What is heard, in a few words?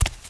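female elf sage run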